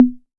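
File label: 808 mid conga, Tape; Tape808; Goldbaby Tape808 & Tape909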